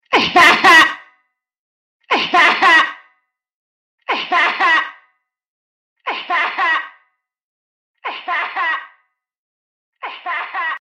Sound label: laugh reverb; 0luigi